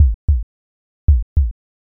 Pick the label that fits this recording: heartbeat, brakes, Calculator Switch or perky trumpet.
heartbeat